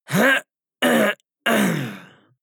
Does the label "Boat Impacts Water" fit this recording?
No